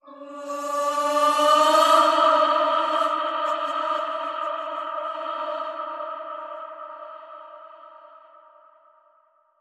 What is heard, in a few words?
Choir Bend